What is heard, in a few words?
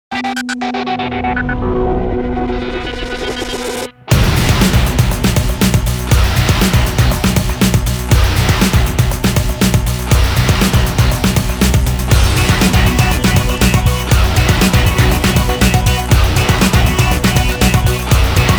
Electro